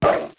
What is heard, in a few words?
Wolf Lunge; Wolf Link